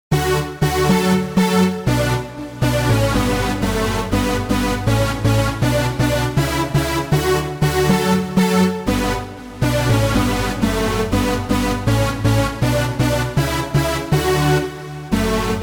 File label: saw crusher; jupiter-80 v2